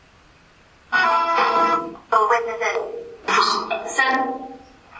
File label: Phasma Box; beaver area high school investigation